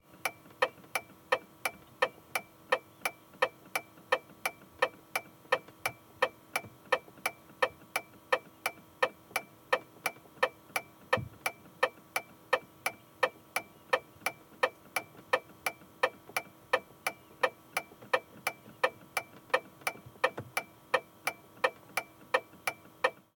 Auto Volkswagen Passat

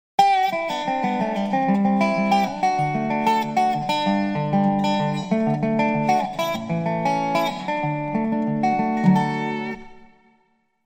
Dobro Version STING

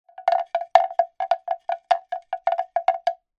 BELL WOOD

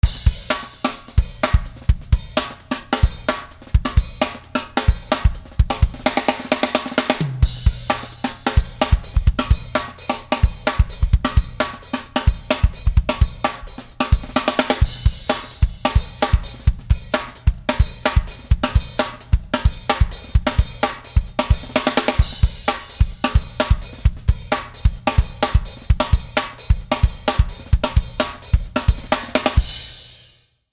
Acoustic Drums 60

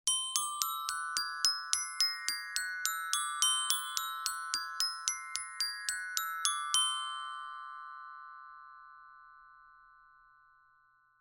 PERCUSSION ORCHESTRA BELLS

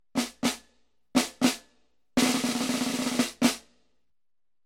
Military Snare Drum March

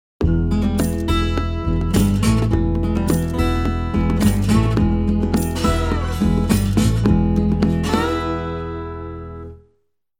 Boxcar Blues STING

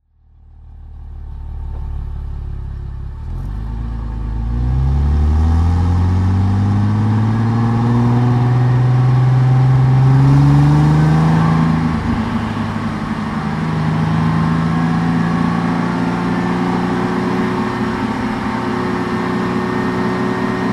Auto Cadillac CTS Exterior On Board Drive Medium

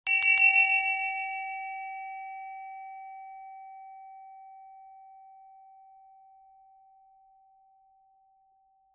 BELL HAND

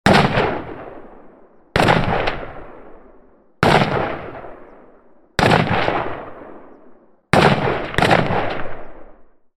GUN RIFLE

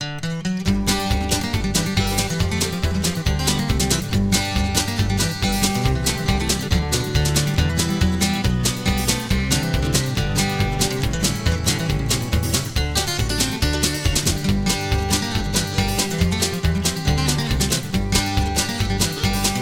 Pickin Parlour

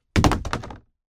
Container Plastic Storage Medium Empty Drop

